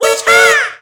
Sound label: Car Horn; Hyperdrive Massacre; Oriental Storm